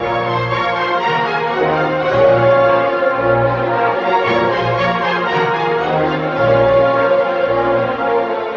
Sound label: horns, Sad